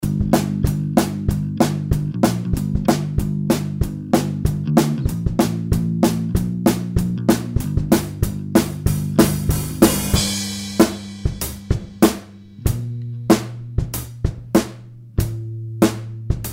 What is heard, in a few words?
fallback drum bass